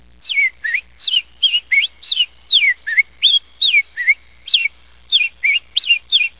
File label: robin